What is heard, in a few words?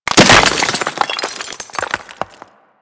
Container Rubedo Open; Objects; Material Specific Loot Containers; DE; Warframe; Storage Container